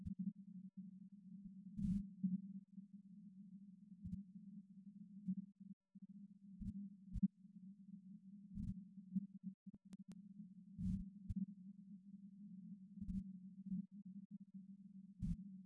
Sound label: piano 1 layer